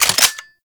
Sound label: Heavy Shotgun Fire Mode Flakshot; Weapons; Warframe; Tenno